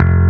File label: bass